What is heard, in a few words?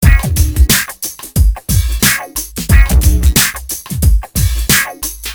depressing rock music